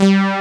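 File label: synth A bass, JV 1080; Pro-Rec - Synthsonic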